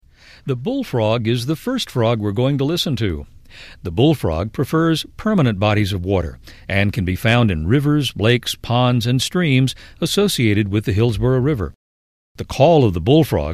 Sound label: bull frog